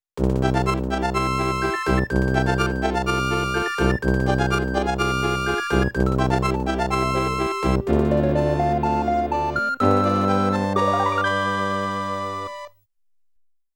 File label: Castle Clear Scene BGM